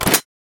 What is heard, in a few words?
pickup; midnight guns